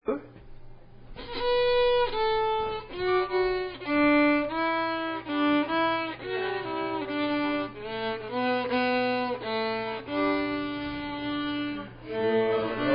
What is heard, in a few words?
Auld Lang Syne Violin; 2009 Concert